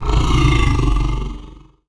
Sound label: roam